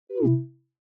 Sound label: chat response received